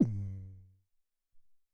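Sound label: Hmm Kick, Alesis SR-18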